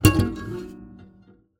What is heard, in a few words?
Classical Guitar Extended Technique